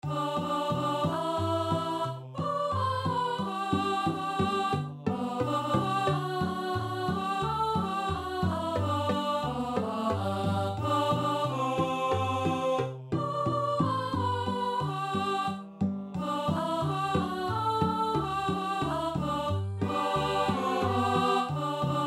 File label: Chorus Women